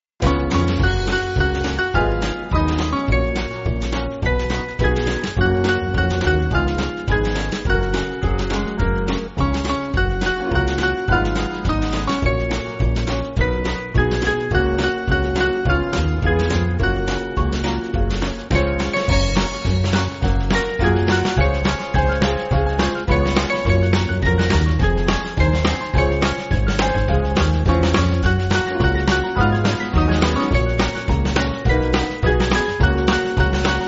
I've A Message, band

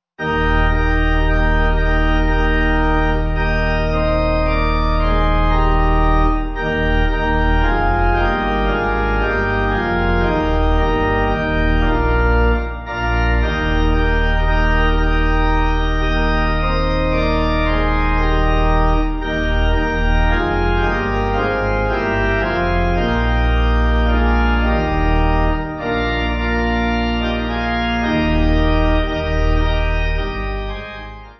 What Shall I Do, Eb, Stella, Pipe; 8.8.8.8.8.8; Organ

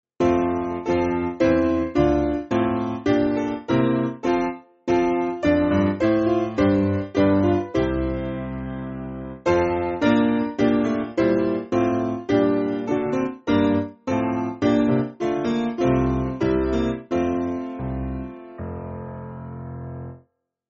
Piano, Music Backing Files for Churches; There Is No Moment; Kenwyn

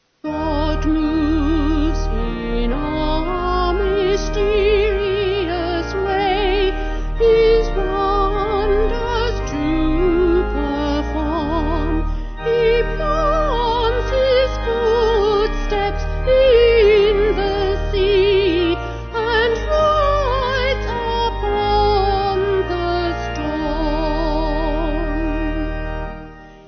Vocal Organ

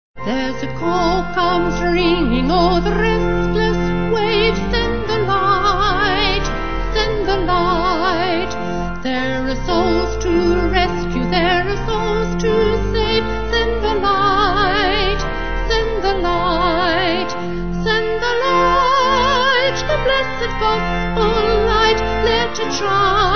vocal organ

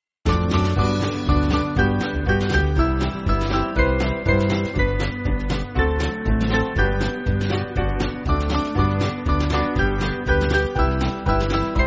Band; 哈利路亞; 3/G-Ab Three Times

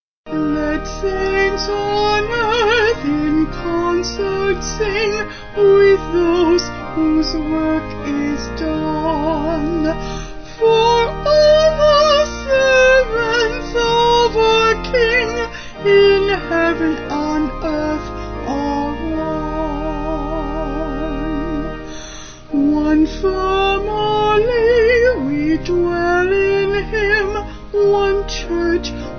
Vocal Organ

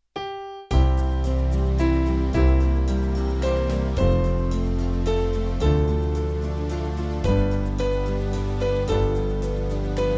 Vocals Band

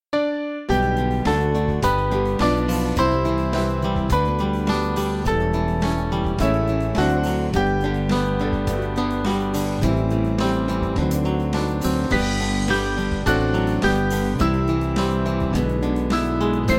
Band, Music Backing Files for Churches; God Who Made The Earth; Sommerlied

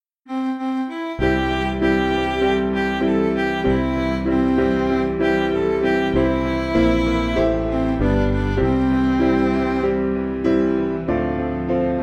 Midi

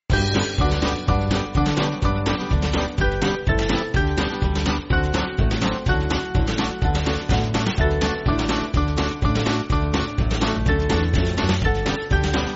Jesus Bids Us Shine, band

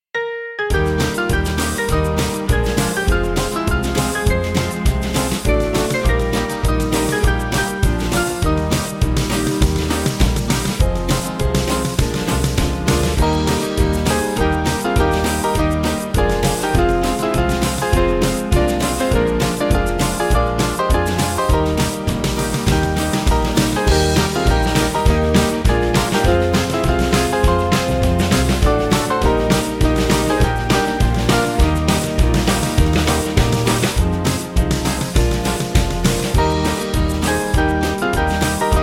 Oh Hes Coming, Band; Small Band; Benson

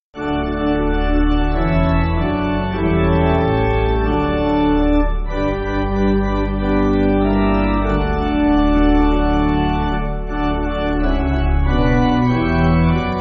Pipe Organ, Music Backing; Routh

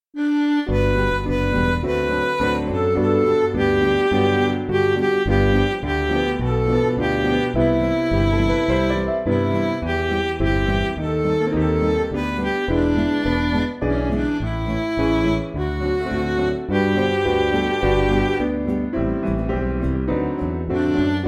I Know Who Holds, Midi; Smith

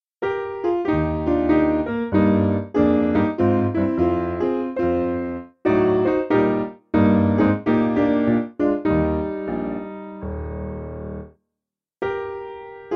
Piano; Oh Hes Coming Back; Benson

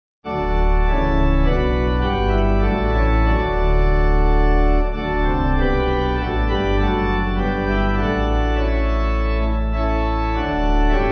Pipe

